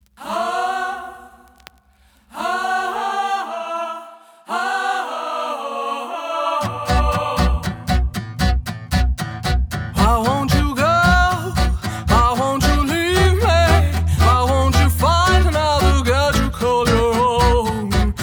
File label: Ringtone